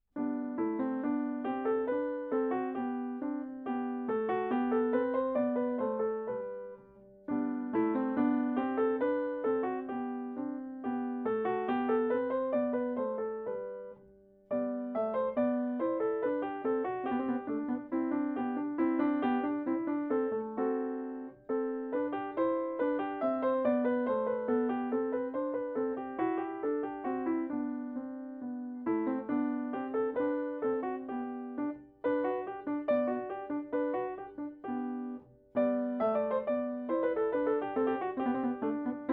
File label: bach musette